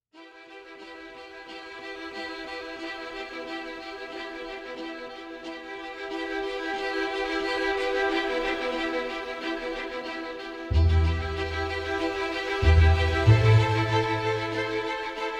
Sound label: Valse Muted Strings bridge